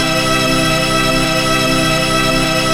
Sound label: synth; 'Effection' samples; lolicore